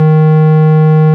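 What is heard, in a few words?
ORG, Farfisa organ; Roland LCDP09 Keys of the 60s and 70s 1; Farfisa Clar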